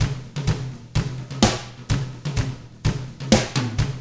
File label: 127 Drums